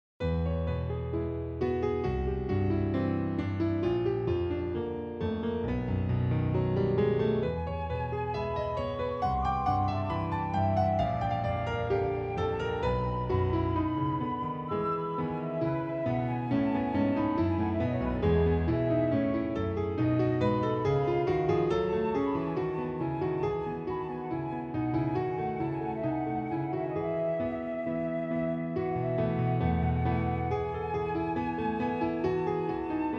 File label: with flute